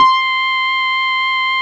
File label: Pianny C5, Pianos; Fairlight CMI IIe